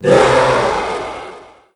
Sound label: shield break; Apotheorasis